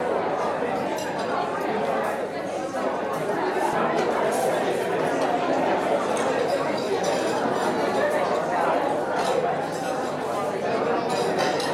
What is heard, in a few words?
Eating place